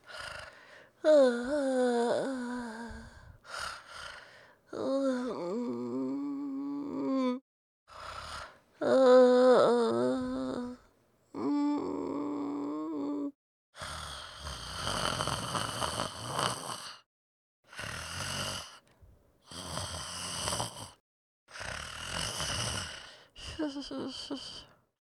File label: sleep loop female